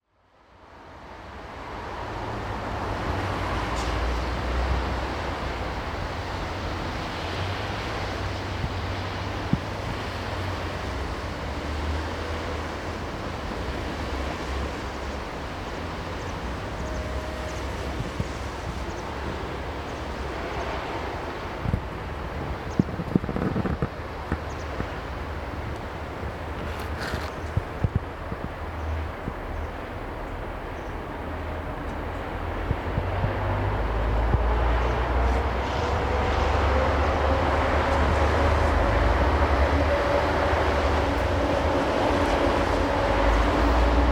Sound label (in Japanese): onibakousaten; 交差点 車の走り出す時の音の流れ; 鬼場交差点; Chino Cultural Complex; 茅野市民館